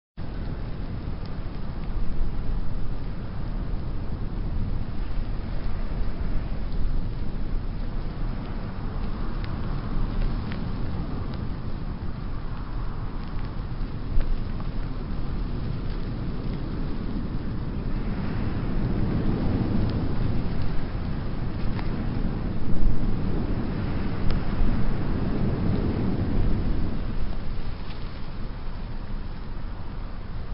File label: Snow Ambience